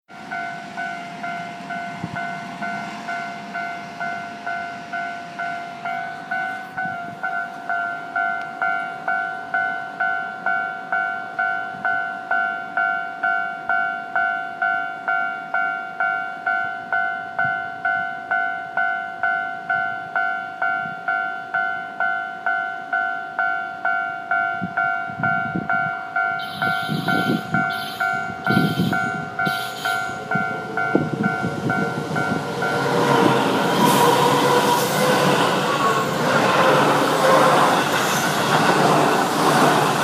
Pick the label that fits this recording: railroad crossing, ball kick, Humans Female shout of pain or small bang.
railroad crossing